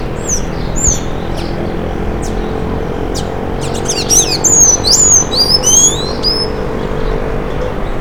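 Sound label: Thraupis sayaca song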